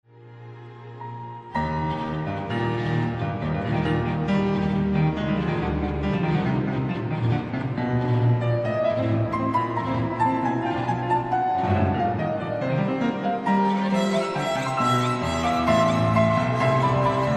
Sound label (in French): concerto piano trompette; Chostakovitch